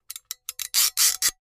Caulking gun being loaded and unloaded. Tools, Hand Clicking; Woodworking Carpentry, Pumping